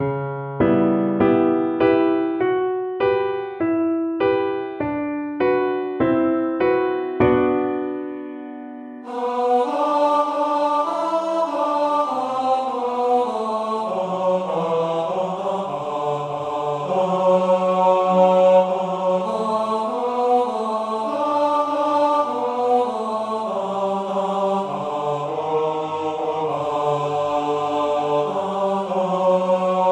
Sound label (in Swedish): Julvisa Tenor; Sibelius